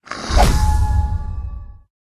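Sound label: lizard shaman attack bottom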